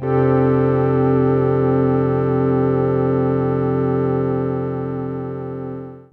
PAD Polygonz Pad

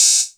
Hihat open

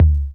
BD Short Vinyl

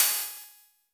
Hihat O

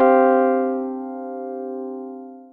SYNTH Piano; Interbellum

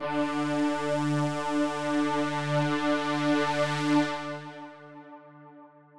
analog D4; analog server

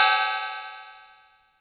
Cymbal; TRX

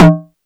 Perc; EFM

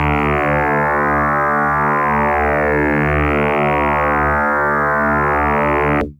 Electric Bass DX100 D2

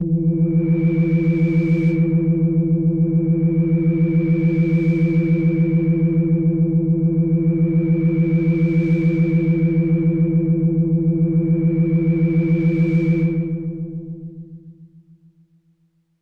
White Siren L E2; pad; Kawaii

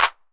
Clap 808 Color, Hand Clap

